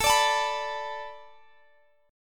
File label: A Suspended 4th Add 9th A